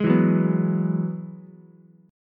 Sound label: F sharp 7th Suspended 2nd Suspended 4th down Ukulele; F#7sus2sus4 strummed